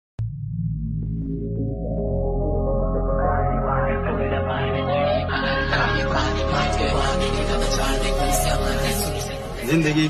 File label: marathi trending ringtone marathi ringtone tune ringtone bgm ringtone